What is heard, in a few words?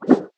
skeleton attack; allacrost-0.2.1